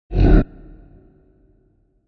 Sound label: Wipe Low Short Tail, Fast whoosh, called a wipe; Fast whoosh, called a wipe, for video editing, movements, logos, flash, or animation; Wipes